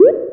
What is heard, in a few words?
pickup, sfx; timber